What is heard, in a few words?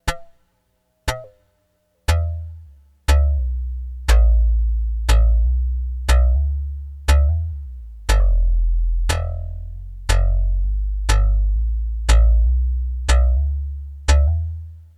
karplus strong; zdsp; modular demos